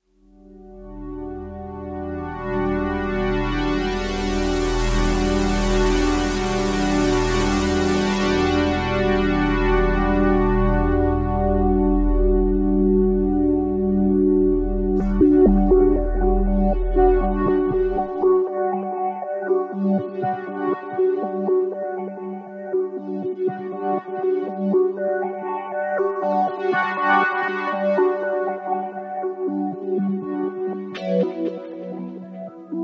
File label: pad